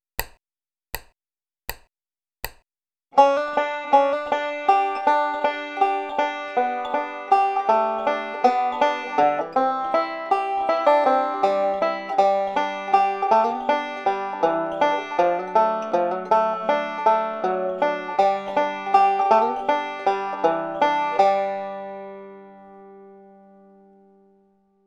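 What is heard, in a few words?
lick solo, banjo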